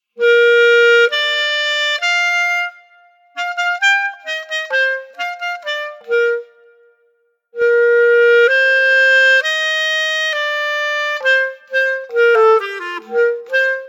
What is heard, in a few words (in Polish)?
Klarnet